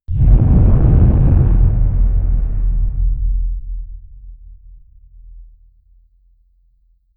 an intense explosive clap; impacts

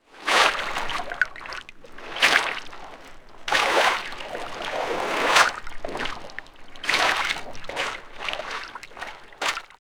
walking through a pool of, wading

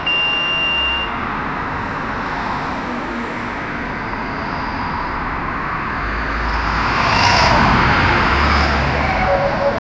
traffic sounds beeps from

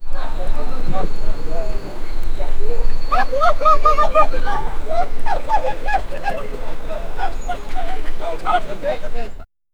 people jogging

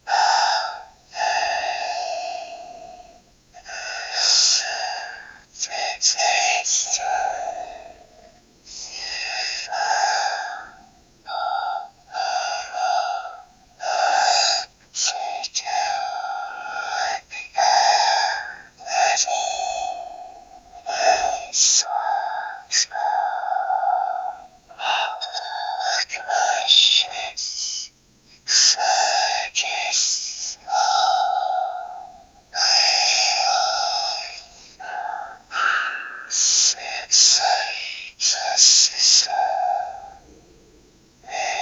crowd whisper illegible; ancient language

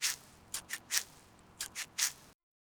short non vocal planting, garden

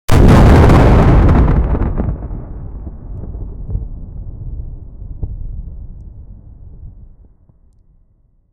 immediate violent sonic, battlefield

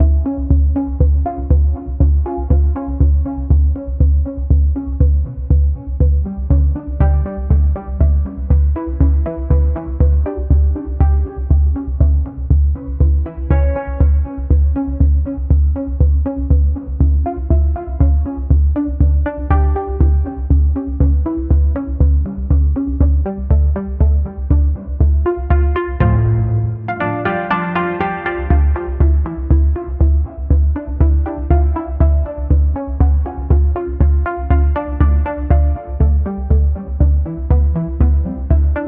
synth from outside a nigh, nightclub

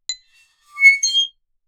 glass creak

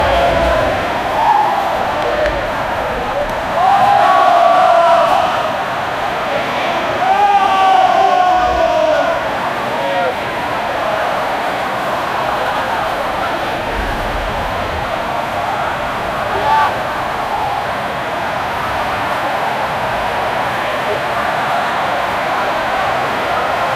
soccer stadium crowd happ; anger

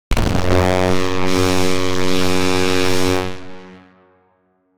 loud trumpet sound, brass instrument

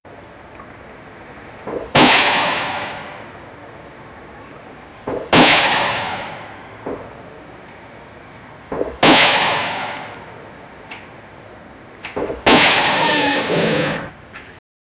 sounds of shots from ak47

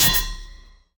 stinger with metallic; divine

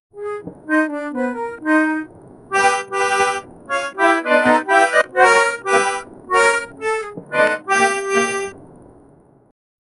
generate a 10 second come, accordion

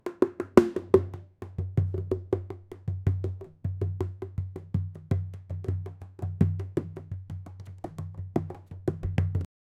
indian folk drum sound; world

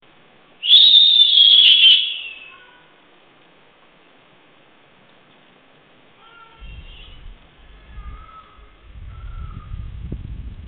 rain drops on a window; human vocalizations

